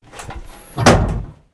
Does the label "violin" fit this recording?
No